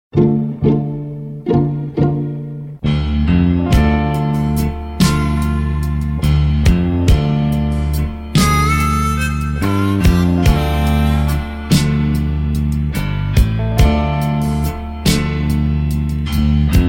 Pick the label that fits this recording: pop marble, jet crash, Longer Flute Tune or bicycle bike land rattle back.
Longer Flute Tune